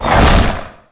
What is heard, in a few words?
zombie stab miss, claw; tayo escape